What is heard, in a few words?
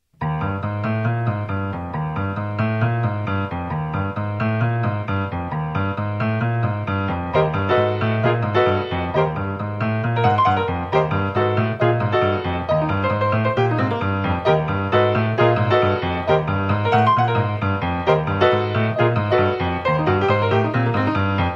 Breakdown